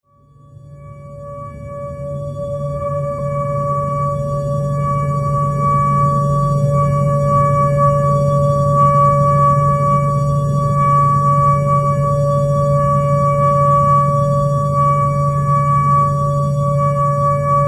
choir of darkness

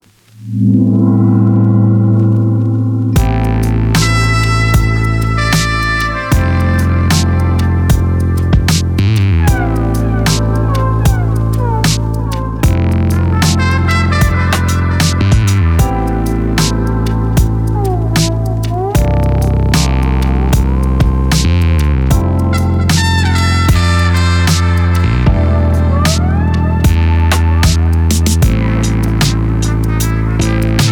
noise floor

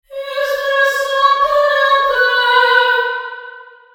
opera reverb soprano voice

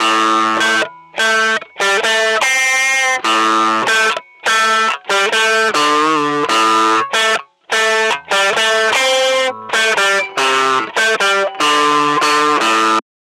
guitar

